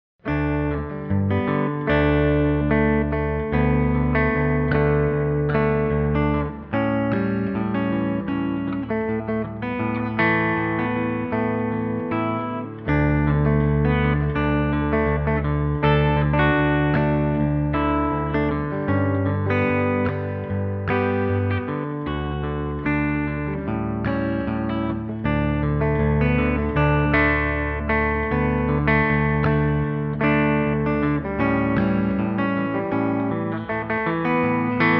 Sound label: happy birthday electric